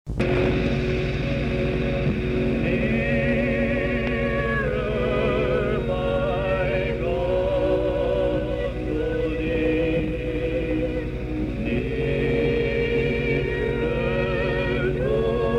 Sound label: hymn